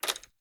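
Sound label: gear rattle weapon large; viper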